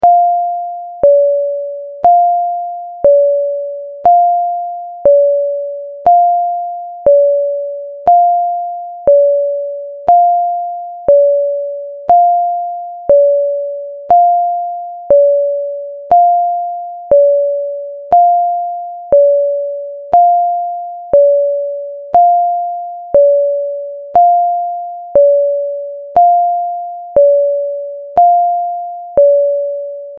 Ding-Dong

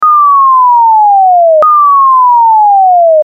Yeow

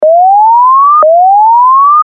Whoop

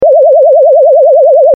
Toxic Gas

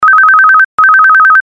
Phone Bell

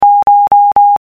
Vibrato

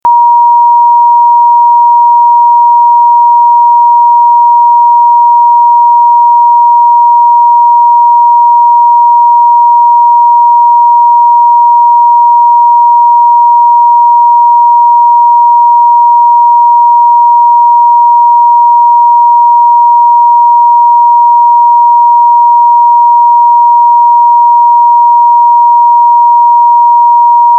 Steady

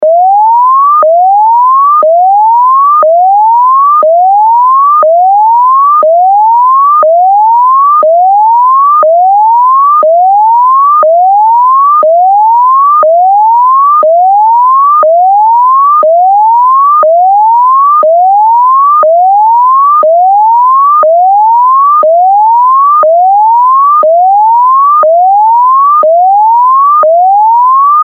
Whoop

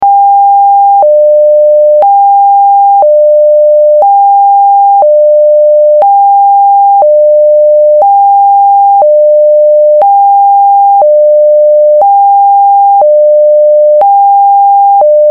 Hi Lo

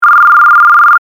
Birdie

